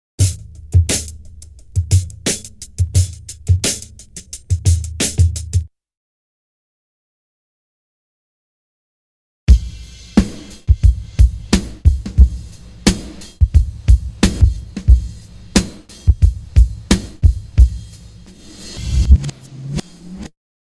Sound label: Drum loop; BEST OF DRUM BREAKS 30